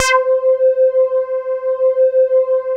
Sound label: SYNTH3 C3; Analogon